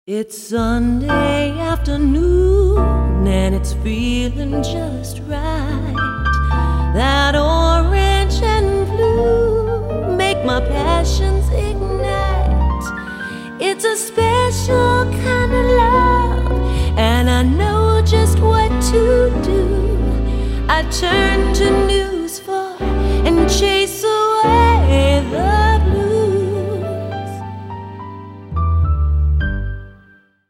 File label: Blues